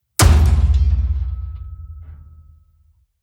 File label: Impacts PROCESSED; SoundBits; Just Impacts